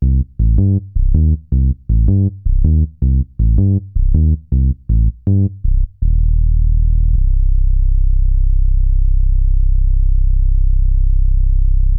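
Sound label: synth bass, ambient, 160, C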